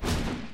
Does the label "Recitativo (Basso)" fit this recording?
No